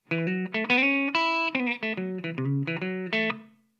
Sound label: les paul dry chanel; koenig blue note gitarren top; Harald König